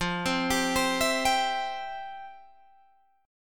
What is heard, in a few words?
F Major 7th Suspended 2nd, Guitar, Drop D; down; chord shape